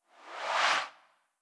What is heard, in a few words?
BG Scroll; blue archive